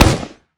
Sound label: grenade launcher shoot; tf2